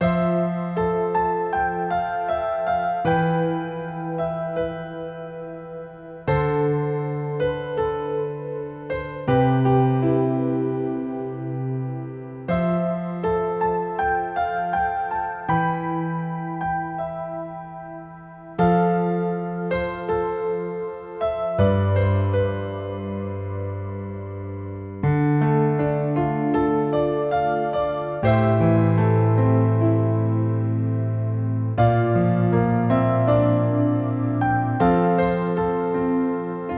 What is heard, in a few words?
Loop; winter memories windows gamerip 2024; Sorrow